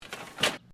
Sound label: METAL SCRAPE SMALL; Sound Effects:Materials